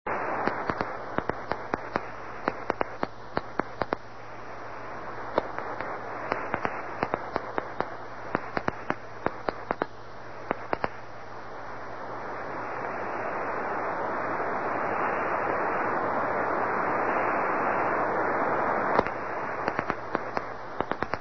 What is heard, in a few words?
qrm